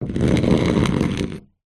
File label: foley wood scrape sharp pointed object; zapsplat